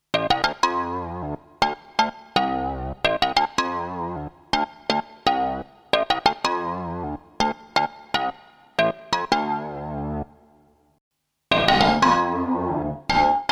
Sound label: Yamaha SPX90 Early Refl 2 Synth